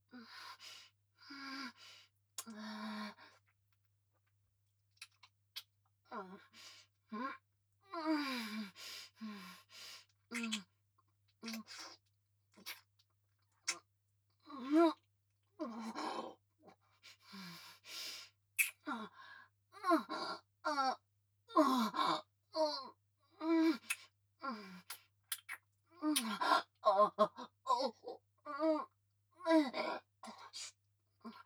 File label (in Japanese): バック喘ぎとクンニ・ゆっくり; ヘレナ